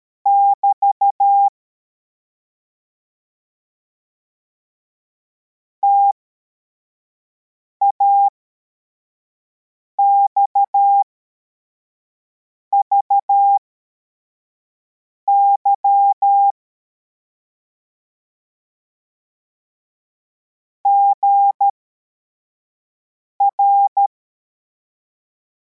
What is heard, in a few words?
cw, morse; formation